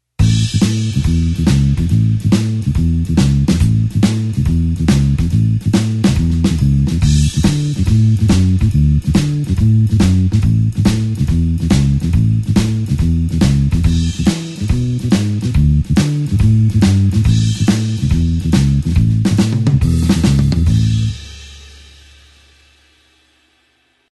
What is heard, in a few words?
blues bassline shuffle A